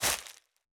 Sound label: footstep softwet; The Swapper